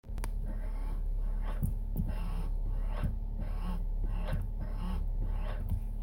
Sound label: brake pedal friction and clicking noises; tires wheels and brakes